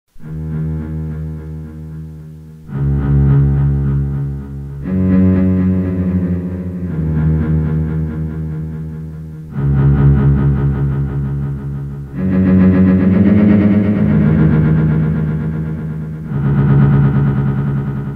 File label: Microfreak cello scan grains engine